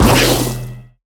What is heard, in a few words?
blood laser weak